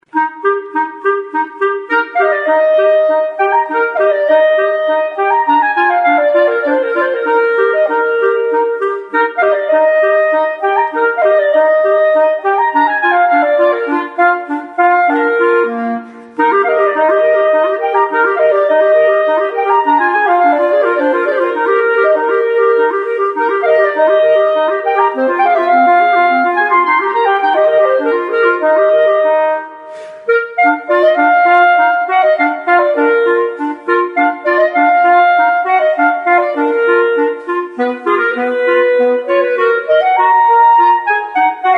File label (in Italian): duetto